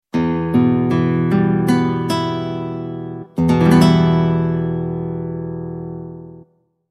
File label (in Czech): Akord e moll